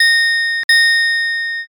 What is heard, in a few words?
bike bell